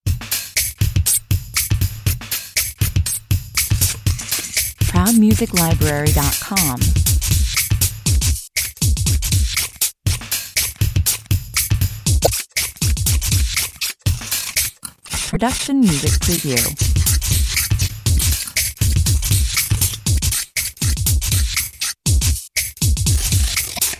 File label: beat